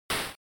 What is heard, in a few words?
NES General Noise Single